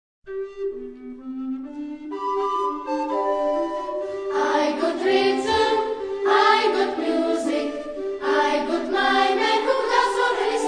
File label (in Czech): Komorní sbor, flétnový soubor; Bel Canto